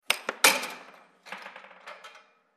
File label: Air Hockey Puck Hit